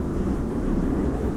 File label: gate idle loop; shadow; Hollow Knight